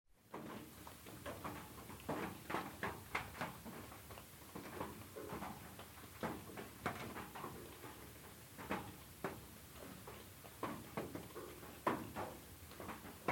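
rain drizzling outside the window and separate drops on the windowsill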